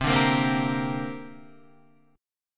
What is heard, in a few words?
C 7th Suspended 2nd